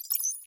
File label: expand